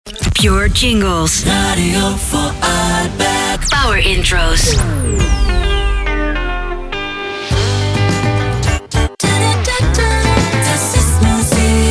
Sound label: jingles power intros; orf radio vorarlberg; pure